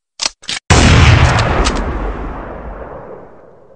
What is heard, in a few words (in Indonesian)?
Nada Dering Pesan Suara